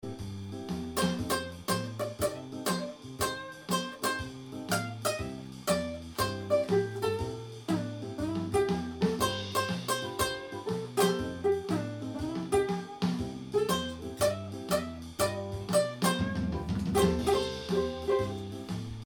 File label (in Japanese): luna ukurere sound